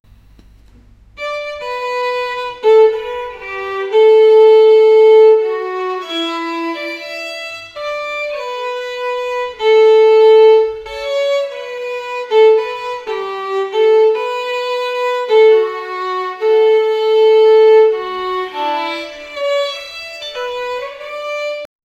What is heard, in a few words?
violin